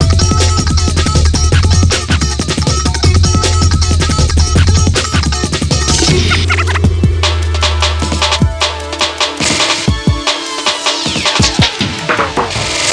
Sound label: Funky Drum n Bass